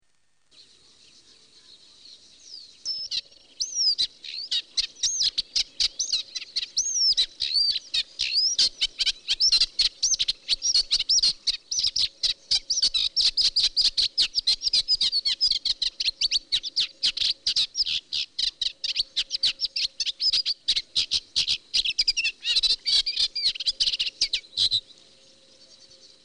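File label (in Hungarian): Cserregő nádiposzáta; Madarak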